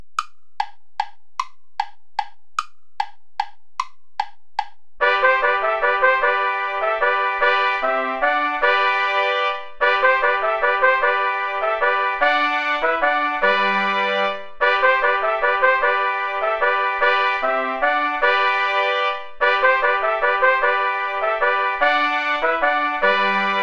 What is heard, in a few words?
volta play along without trumpet; praetorius michael